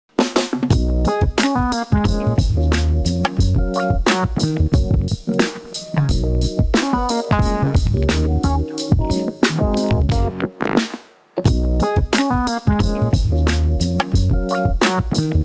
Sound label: funky beat